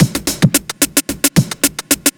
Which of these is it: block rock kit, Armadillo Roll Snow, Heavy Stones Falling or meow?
block rock kit